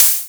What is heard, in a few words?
Open Hihat